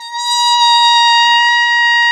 BRS TP, Roland, TRUMPETS; Roland - LA Composer vol. 1

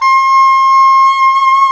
SAX SOP MF, Soprano Long

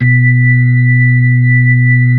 B-3, Hollow

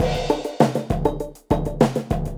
drloop, drumloops, Vinyl Attack; Masterbits

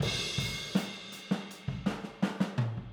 Boogaloo Fill Overhead ST, 82 BPM, Drums; Sonic Foundry (Sony Creative Software) - Drums from the Big Room The Mixes

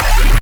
bass C#; mindsweeper

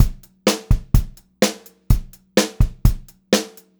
126 PO, Pop-Rock Drumloops

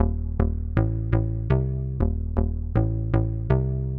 SH101 Bass Loop (120BPM), retro house

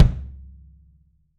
kick, 3D Drums; RUBB KICK

